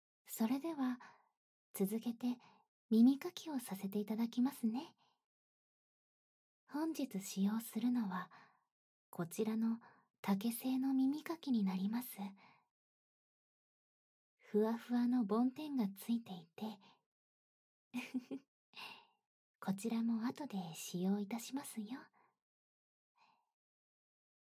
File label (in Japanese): 耳かき 右耳 反転, ASMR